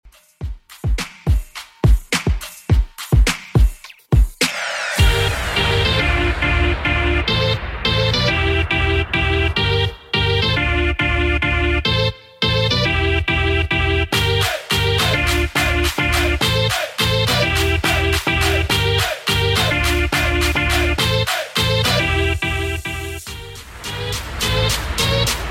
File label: Moombahton 105bpm CLEAN